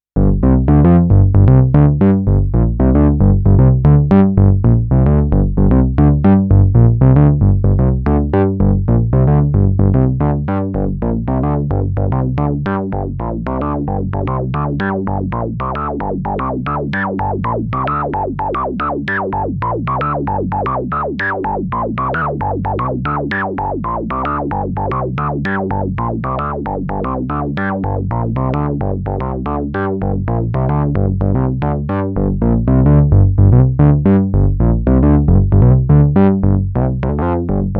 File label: Resobass; Grandmother